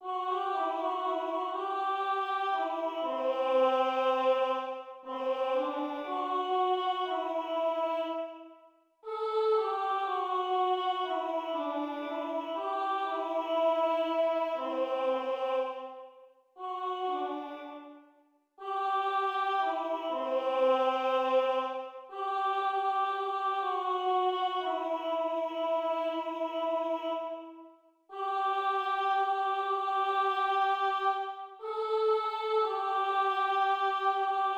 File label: oboe